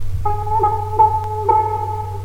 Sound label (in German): bahnhof; helpTheTrain